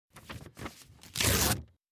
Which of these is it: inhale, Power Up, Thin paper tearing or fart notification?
Thin paper tearing